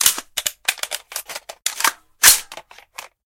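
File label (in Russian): перезарядка пулемета; pered boevimi dejstviyami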